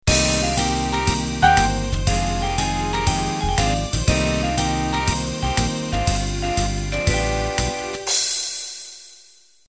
cool jazz intro

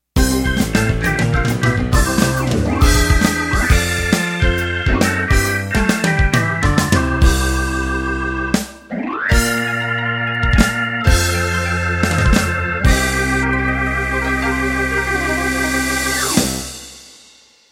Gospel Rock End

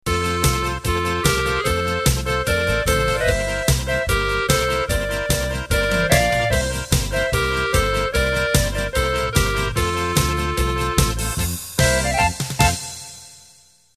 Polka Bavarian end

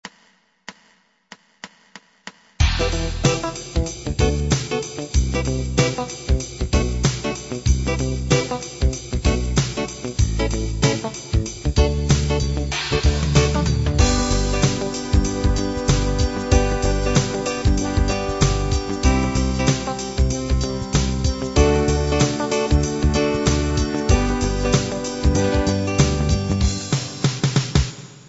hip hop

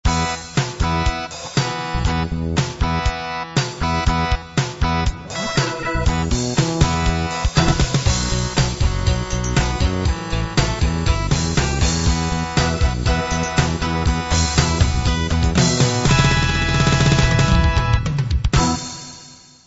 open rock

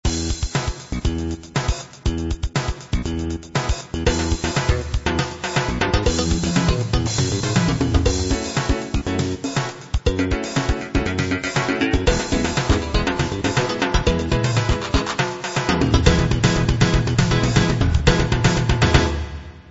funk

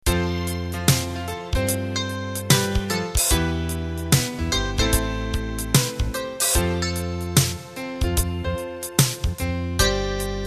Swing Rock Intro

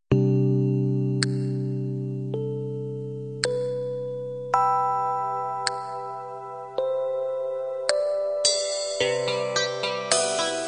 halftime ballad intro

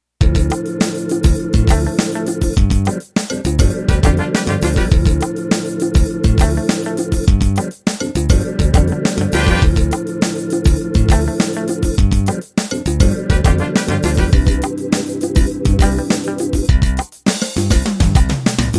16beat

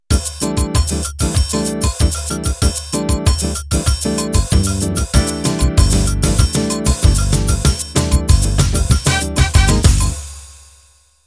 Samba De Sol Intro

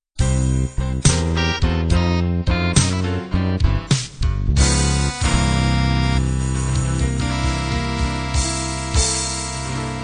slow blues end

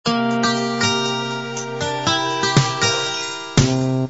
uk rb intro

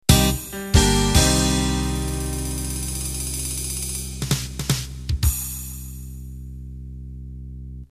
Unplugged Slow Rock End

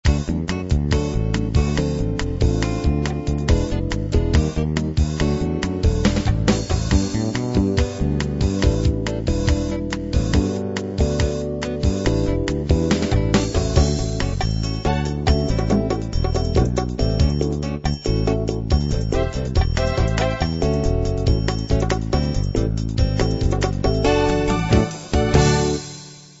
fusion

